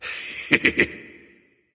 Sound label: "heh heh"; smite; voicepack; ullr